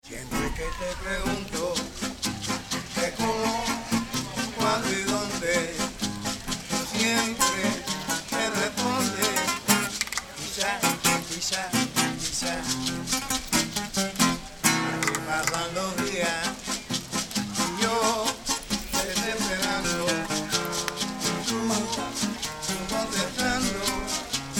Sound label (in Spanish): musicos plaza vieja; cuba; la habana